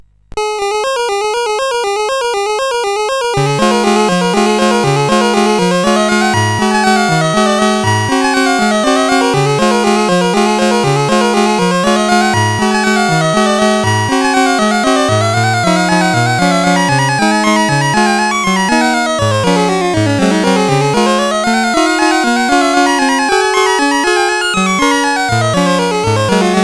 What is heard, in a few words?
Minute Waltz, CSG8580R5; sid